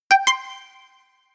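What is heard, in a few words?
jam, electronic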